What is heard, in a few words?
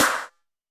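Snr Pop Shot, snare; Alesis D4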